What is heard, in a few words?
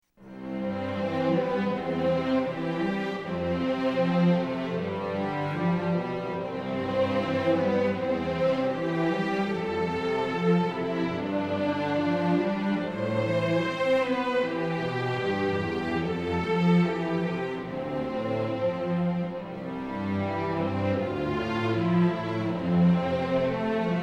Returning + Piano